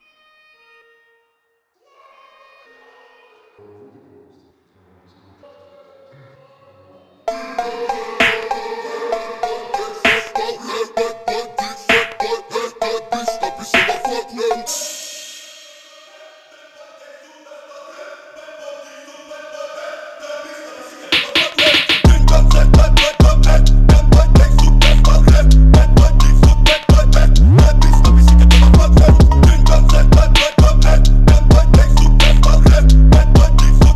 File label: PHONK REMIX